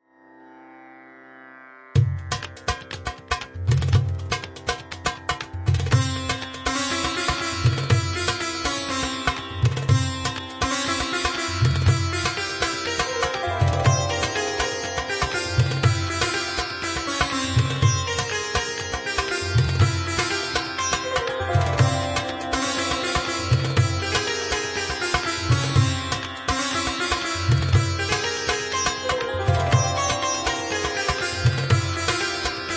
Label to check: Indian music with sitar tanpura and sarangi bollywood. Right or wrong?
Right